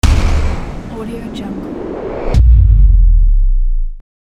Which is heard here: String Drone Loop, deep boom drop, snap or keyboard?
deep boom drop